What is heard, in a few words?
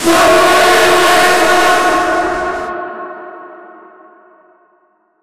create sound of a loud, human body